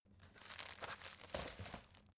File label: folder file jacket turn